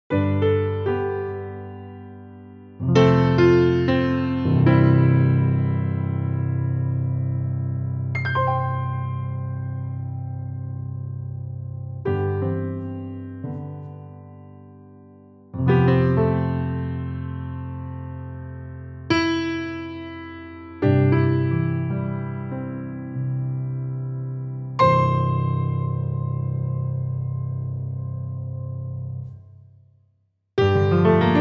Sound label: Antique Grand, piano